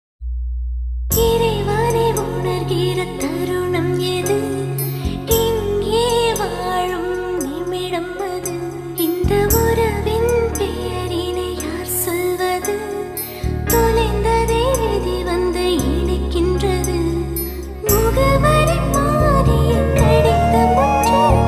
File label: sad love bgm ringtone; veyil